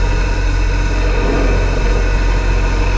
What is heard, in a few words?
machinery